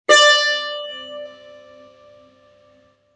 tar re

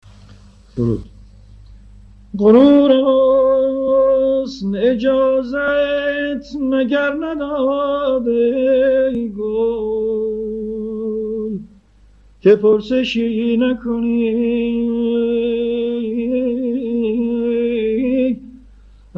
forod; seghah

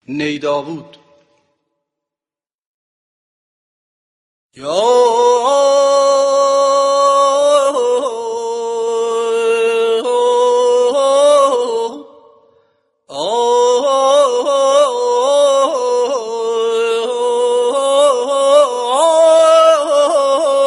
ney; homayoun

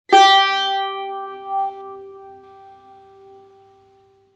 tar sol

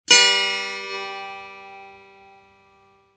setar si c

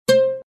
oud do

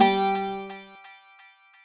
baglama sol, baghlama

